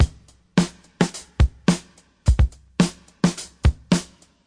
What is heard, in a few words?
106 bpm small snare, drum loops and breaks